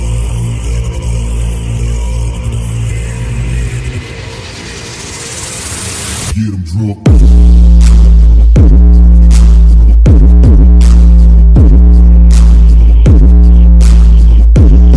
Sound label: Sub test song; Pioneer; آهنگ های مخصوص تست بیس سیستم صوتی